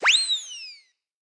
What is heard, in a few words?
Run